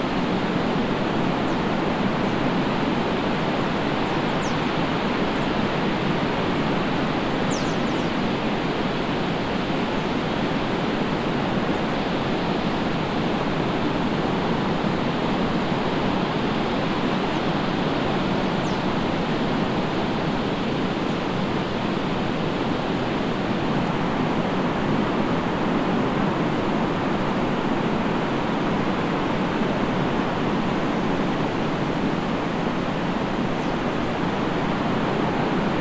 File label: WFR audio emfisis